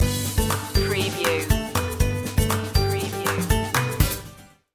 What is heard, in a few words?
another way stinger